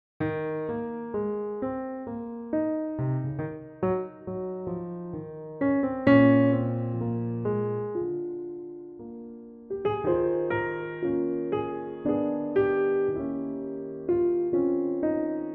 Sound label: piano